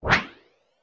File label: Interface Swipe